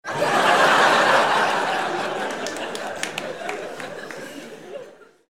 laugh applause

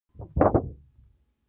paper wobble pickup

